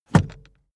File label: car seatbelt fasten soft